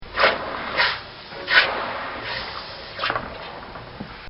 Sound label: Dipping A Hot Horseshoe In Water; Animals; Bobby Cole